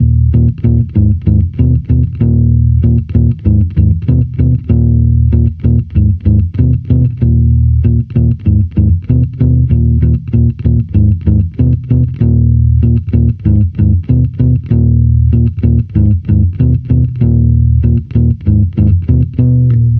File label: Amped loop 96 Ebm, Electric Bass Loops; Slate Digital BASSHOLE Sample Pack